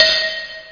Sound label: MT32 bell